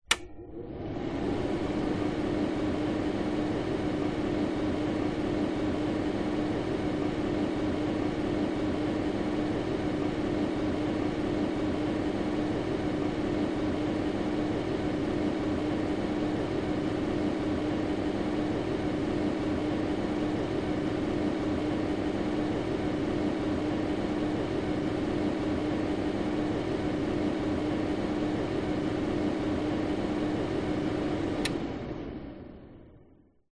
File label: industrial tig welder unit on run off long no welding